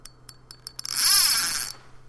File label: Fishing Reel Drag